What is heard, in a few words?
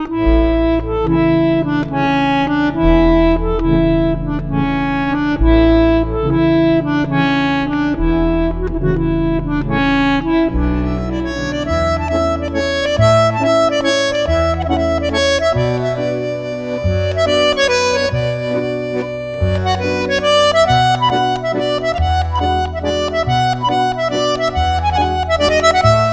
Waltz; Comic 드라마